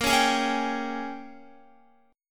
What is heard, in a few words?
A sharp Minor 13th A sharp down Guitar, chord; strummed; JGuitar